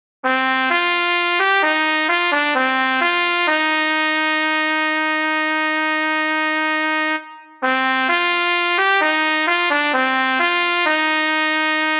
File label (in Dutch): Fluegelhorn